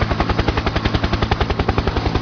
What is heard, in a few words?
heli loop